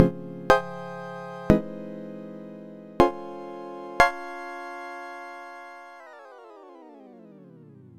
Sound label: Sine Pad hit; Retro Noise 3 Instruments